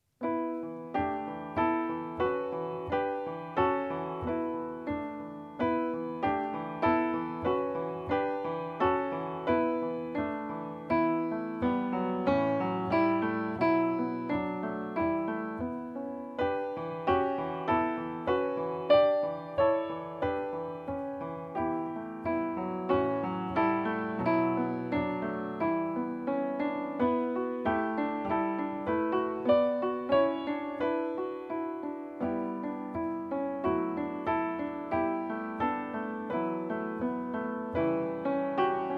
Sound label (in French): Petite flamme piano; bande / son piano